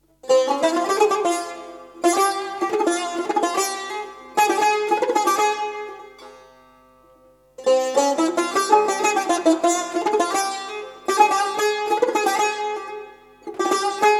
Tar Solo

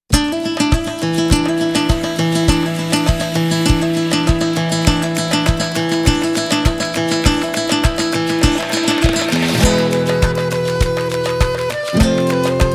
Instrumental

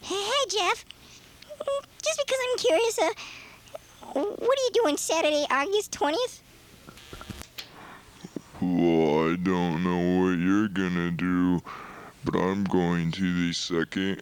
Jam; 1988 Capitol Lake

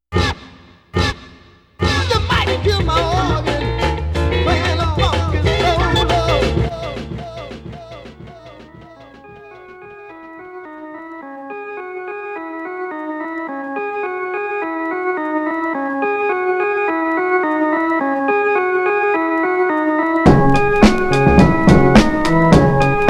Organ

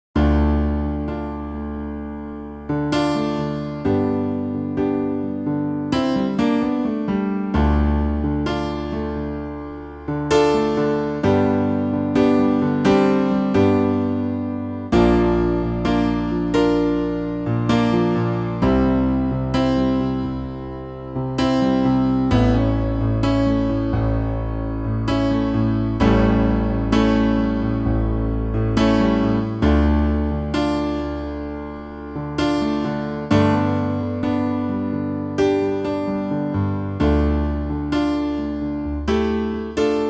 Solo Piano

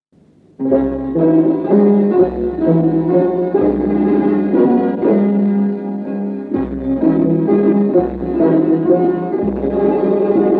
Pishdaramad Hejaz Ensemble

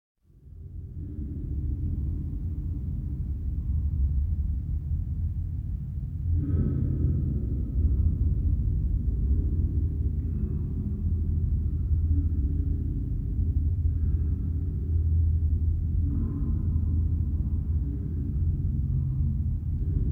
Space Ambience

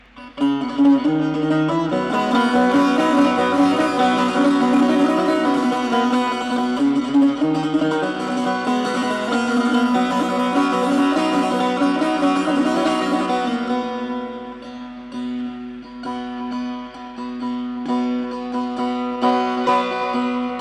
Improvisation In Mahoor

